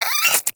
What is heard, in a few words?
Cryptilex Vocal Attack Short; Characters; Warframe; Hunting Critters